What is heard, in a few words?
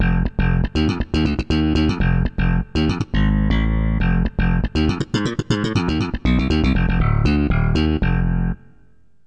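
slap bass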